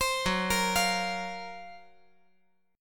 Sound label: F sharp Major Sharp 11th F sharp; arpeggiated; JGuitar